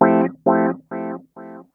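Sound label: delay rhodes; PlatinumPhattCD2; E-MU Producer Series Vol. 8 – Platinum Phatt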